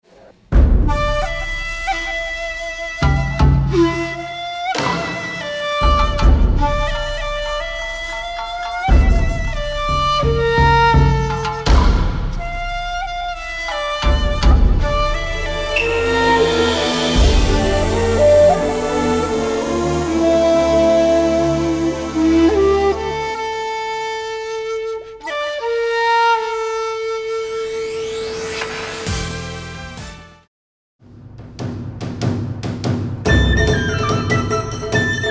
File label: shakuhachi shinobue sample